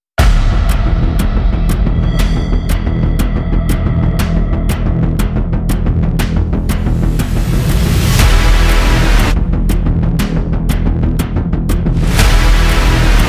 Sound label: Epic Music instrumental